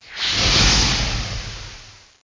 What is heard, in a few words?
burst; alienbreed3d2